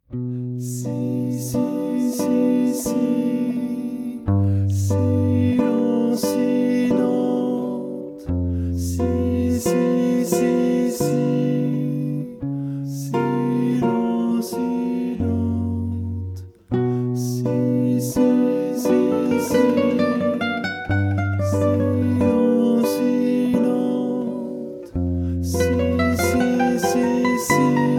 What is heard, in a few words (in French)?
Chant troisieme Le cri